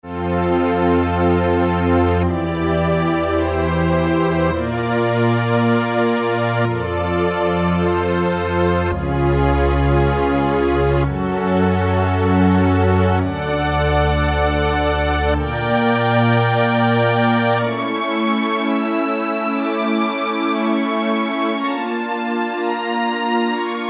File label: String Pad, Kawai K1m; Factory patches - I banks